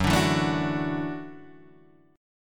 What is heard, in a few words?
Gb Minor 7th Sharp 5th, Gbm7#5 chord; strummed; 2,5,2,2,3,2; JGuitar